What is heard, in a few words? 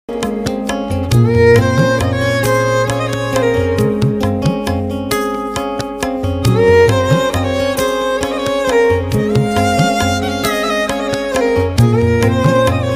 violin cover ringtone